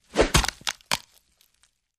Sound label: Bone Breaks Whooshes; punches kicks hits bones